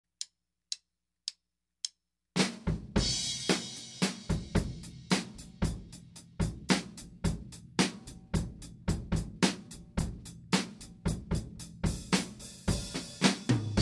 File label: Oddtime Rock 5-4 110 BPM